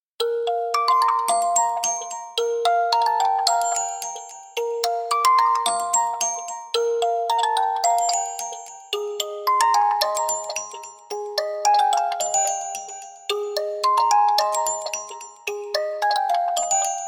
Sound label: Hard Bell, ringtones; Infinix Hot 50 5G